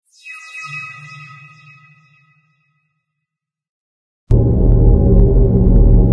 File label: amb random tech; source engine; portal2